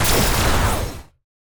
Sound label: laser; spacemap; lasers